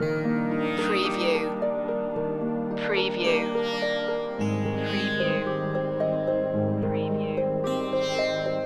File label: yellow mountain loop